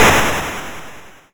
Snare, YM, CHIPSHOP HITS DRUMS; ChipShop Deluxe

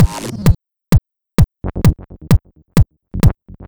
130BPM Kick Bass, techno; musicradar; techno drum fx samples; Beats

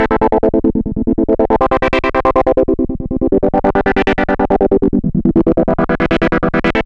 140 Chord G, 140 BPM; loops; CHIPSHOP DELUXE

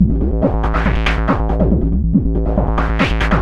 Synth A#m, 140 BPM; loops; CHIPSHOP DELUXE

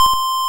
Ym Multi Guitar C3; multi-samples; CHIPSHOP DELUXE

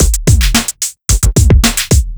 Beat 110bpm; musicradar

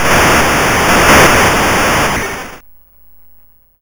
YM Noise; NOISES; ChipShop Deluxe; ChipShop Hits

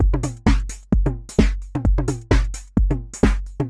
Beat (130BPM), techno; techno drum fx

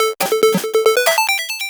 140 Synth A, 140 BPM; ChipShop; CHIPSHOP DELUXE; CHIPSHOP LOOPS

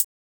Closed hat, techno drum

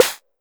Vermona Snare

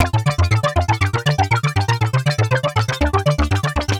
Tech Synth, techno 120bpm; musicradar; Synths

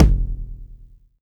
Jomox Tom; techno drum fx; Analogue DrumHits

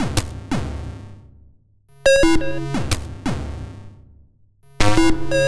Synth C#m, 175 BPM; loops; CHIPSHOP DELUXE

